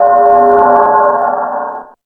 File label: Multi FX, Drum Machines, E-MU Pro-Cussion